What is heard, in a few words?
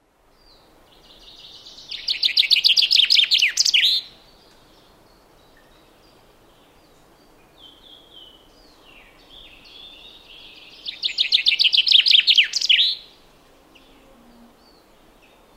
chaffinch